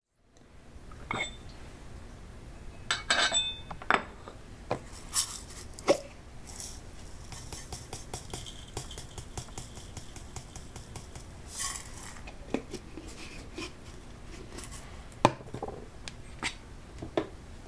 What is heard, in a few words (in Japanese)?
お茶をどうぞ